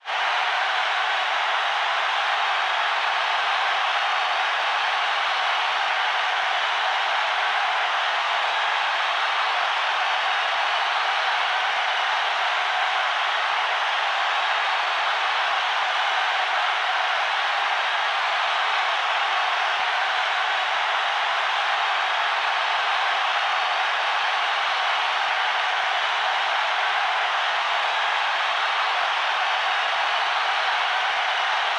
Audience Ambience; Animal Crossing Happy Home Designer

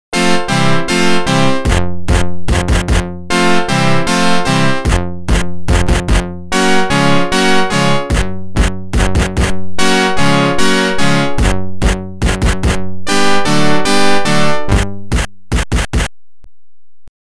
Organ; NHL 94

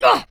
pain; jarlaxle

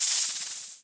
Recoil; SFX 3D Snakepriest

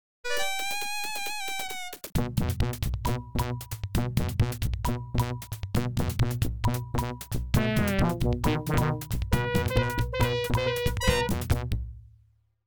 Jungbeat

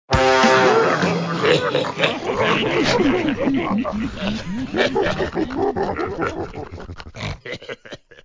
level failed piglets

